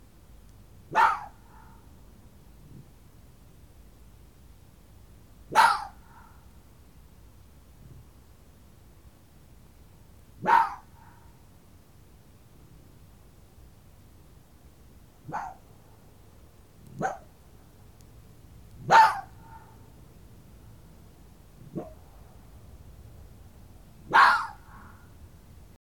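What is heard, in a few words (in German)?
Fuchs Fähe bellt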